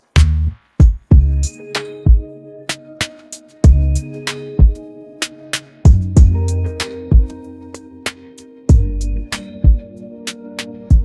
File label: ringtone rnb